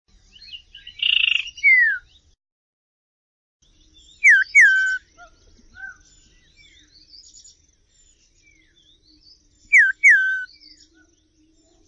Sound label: common iora, birds